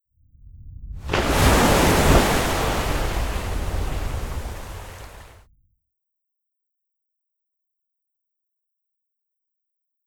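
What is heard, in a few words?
Perito Moreno glacier Calving; Pedromorenoglacier Calving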